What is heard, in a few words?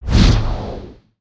Full Swing; Summoner Valiant